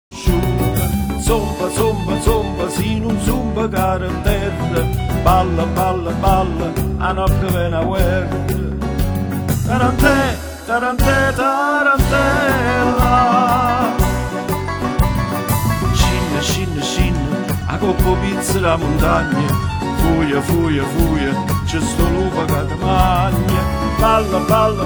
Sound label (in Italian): tarantella